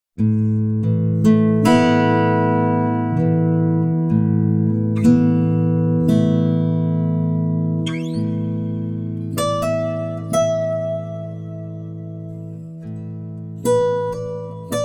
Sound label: guitar, timestretch 200; prosoniq time factory dirac